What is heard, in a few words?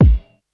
kick; eddyflux; crate bd; dough-samples